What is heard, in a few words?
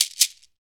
SHAKER, Afro Shakers, Shake&Rattle